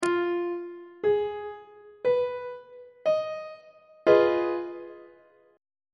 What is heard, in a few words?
a flat minor 6th 3rd inversion on treble clef